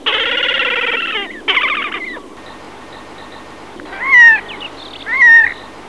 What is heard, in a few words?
Podiceps auritus